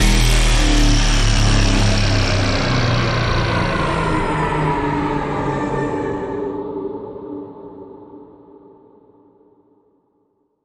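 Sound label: Power Down Digital Sea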